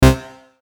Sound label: Hard Stab